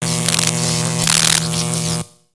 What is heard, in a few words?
Robot Electro Arcs; Lego; ambience nexus tower